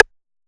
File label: Rim Perc; Cymatics; PHARAOH Beta Pack; Pharaoh Premium Drum Samples (BETA); Percussion